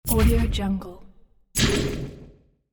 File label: ancient game quest accepted